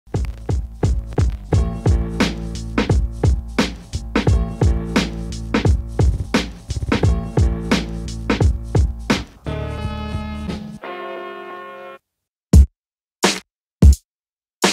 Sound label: Lo-Fi Boom Bap Drum Kits; BEEFY GRIT DRUM STASH VOL. 3